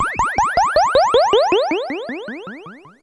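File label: OSCILLATOR DECAY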